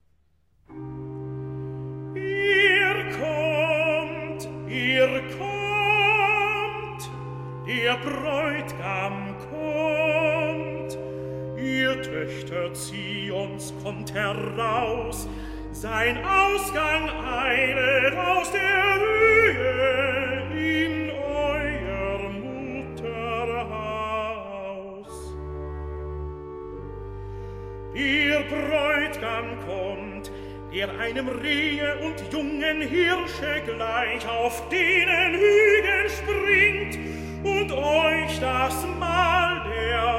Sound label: Recitative (tenor), Vinyl